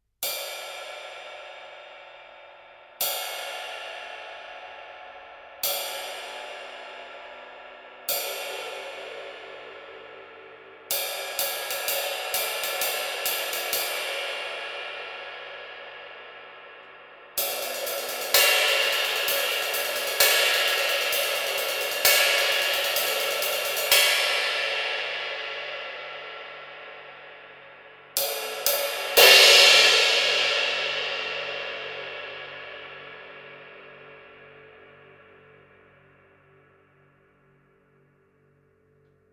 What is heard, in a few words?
hamm r, cymbals; crescent